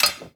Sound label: Snarey; Trusted Brand